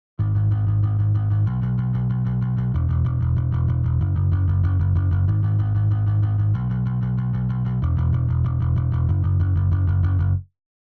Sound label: bass off